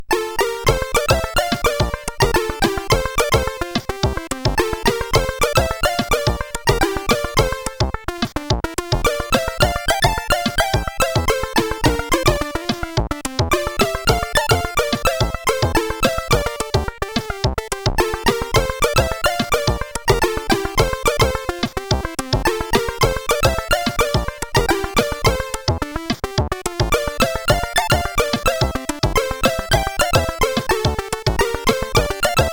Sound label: Intro, sid CSG8580R5